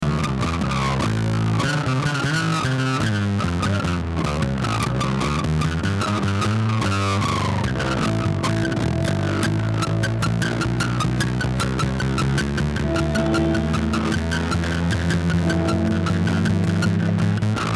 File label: Traffic Jam